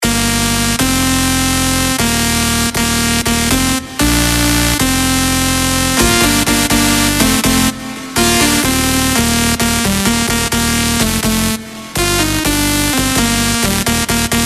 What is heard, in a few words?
FUTURE SAW